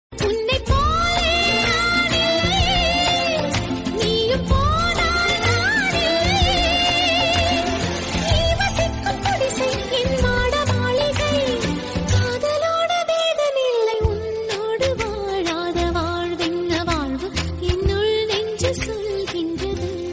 Tamil Song Ringtone; Amarkalam

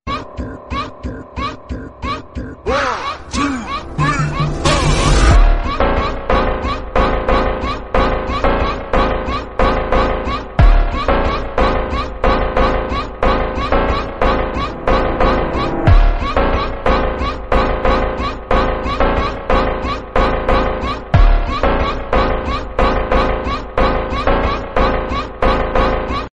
English Song

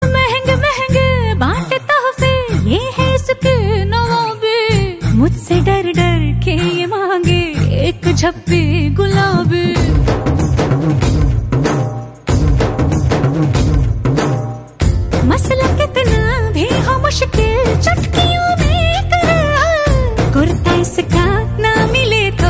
Hindi Ringtone; Allu Arjun

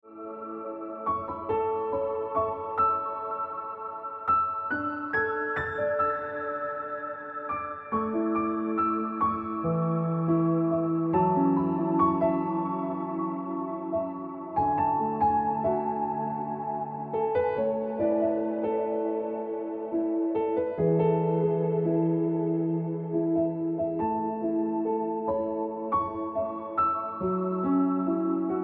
Ringtone Piano Music

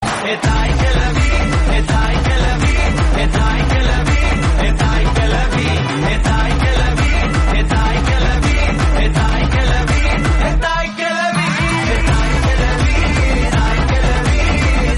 Tamil

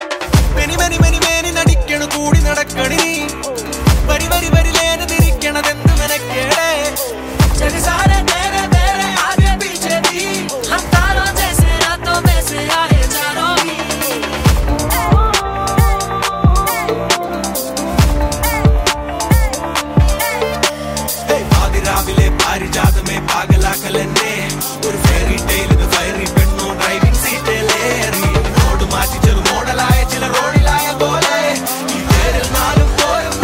Malayalam Ringtone; Movie; Premalu

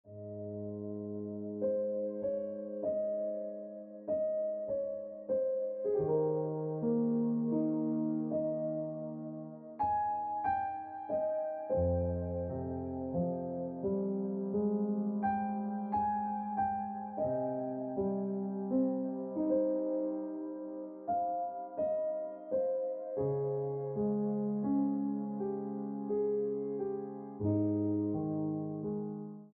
Ringtone Piano Music

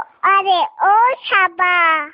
Cute Baby Message; Ringtone; Are O Sapa

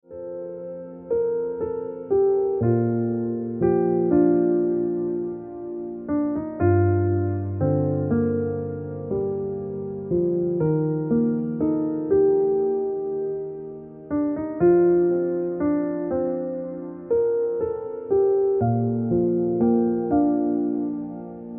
Piano Music

